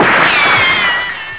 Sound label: gunshot with ricochet